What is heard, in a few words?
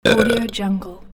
burp